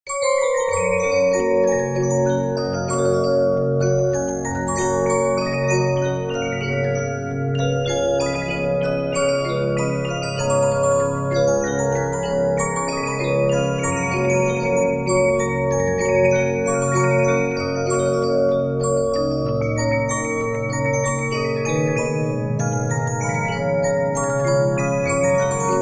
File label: Regina, 15inch disc musicbox